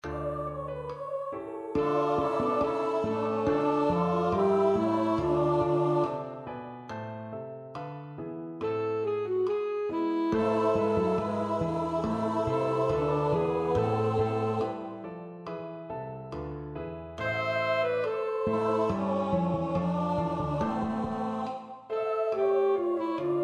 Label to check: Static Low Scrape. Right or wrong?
Wrong